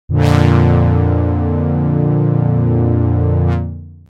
brass reaktor

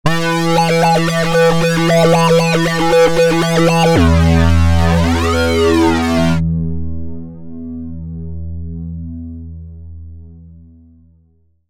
hardsync; obx

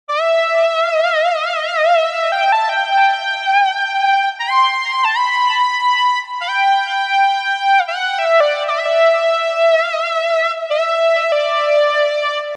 like a train solo; obx

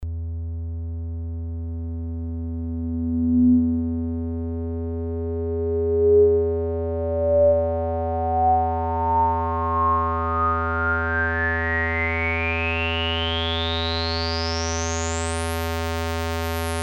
long filter sweep; obx; vst audio; opxvst

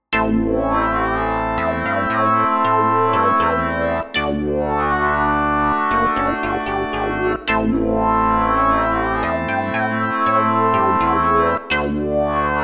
Neg Sync, Jupiter 8; OPXPROII; opxpro2

